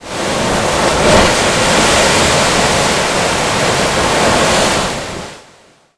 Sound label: GROIN; EARTH